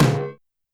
Tom Industrial, YAMAHA RM1X

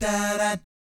DAH DAT, Vocal Jazz FX; Spectrasonics; Vocal Planet; JAZZCOMBO

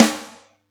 Yamaha EX-5

